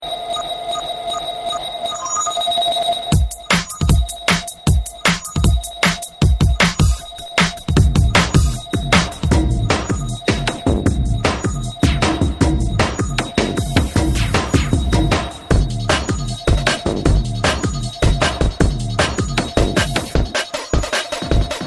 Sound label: Garageband Beats